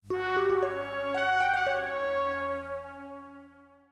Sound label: To Maingame; knight rider